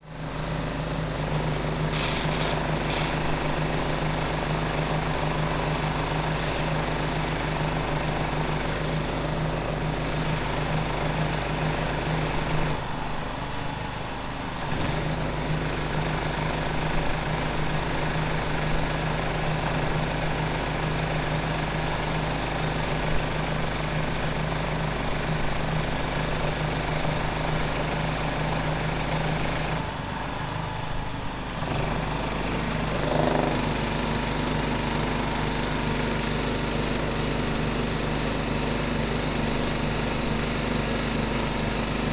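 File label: decontamination near, Fukushima University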